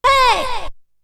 Vocal; ZERO G - Datafile DISC 3

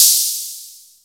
BRUSH, TECHNO TRANC; Pro-Rec - Synthsonic

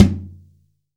15 INCH KICK

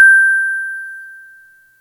Celesta; Yamaha DTXpress

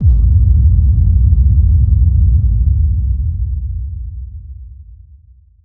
Sub Blast

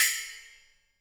HiHat & Crash, Roland TD-20; HiHats

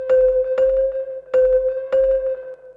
Tape Loop; radiosonics

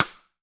STICK, Drum 1 Dry; East Collexion

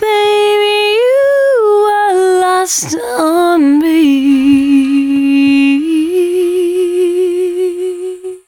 113bpm C#, Velvet Vocal; Zero-G

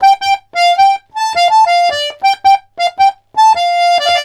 RONDO, Accordions; USB Soundscan vol.40; AKAI

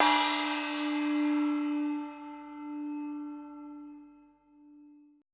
E TIBET; Best Service Advanced Media Trax Modern Composer; ETH TIBET

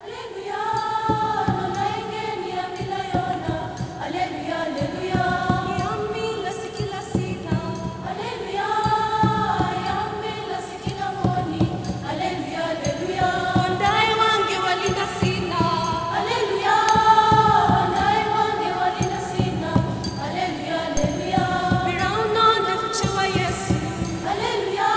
ALLELU FEM, ALL GIRLS; Spectrasonics; Heart of Africa 2

